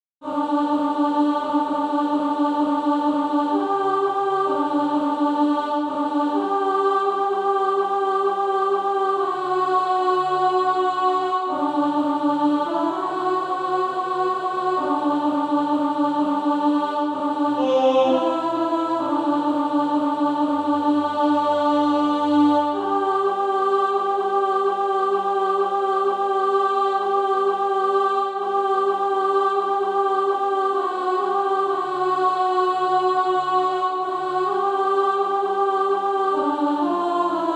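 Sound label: alto, SATB Practise Music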